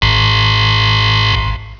buzz

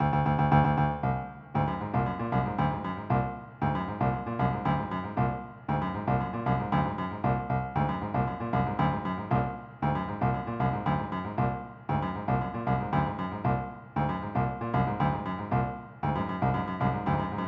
drums

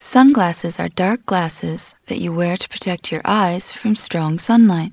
sung

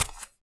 foot; PC Gamer IT CD 45; SMANDEMO; baddys

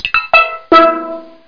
Steeldrum; DeluxeMusic2

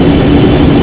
MAGMA; ASSAULT; PC Gamer 46

